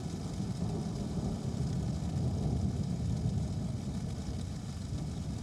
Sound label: Ambience Loop, outdoor